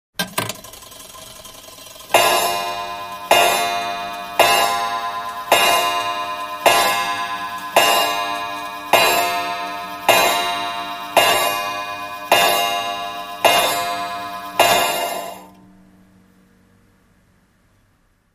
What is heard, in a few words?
Clock, 19th Century, Stikes 12; 19th Century Wall Clock Srikes 12 O'clock With Brass Interior Mechanism And Brass 'strip' Bell